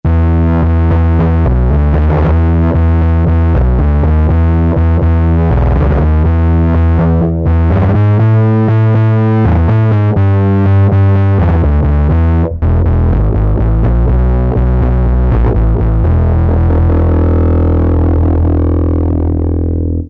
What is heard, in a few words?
Dist Bass; Factory Preset examples